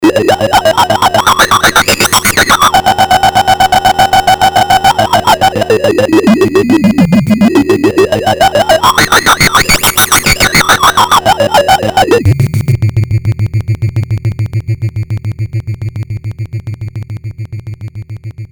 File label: synth talking, talking speech; Polivoks formanta; FX: talking speech